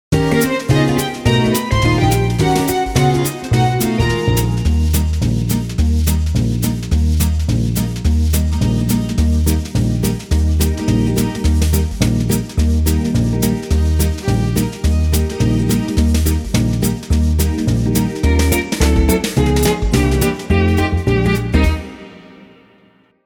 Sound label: gipsy swing